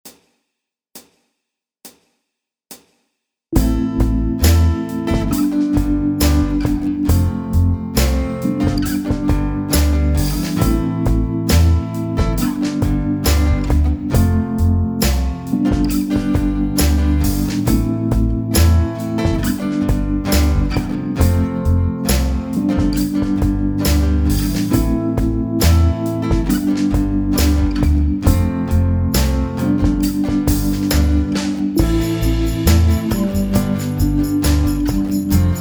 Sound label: Grand Finale Accomp, Guitar